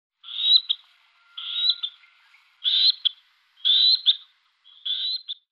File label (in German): Schwarzhalstaucher Podiceps nigricollis; Vogelstimmen